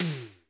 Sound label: tom, Roland MC-909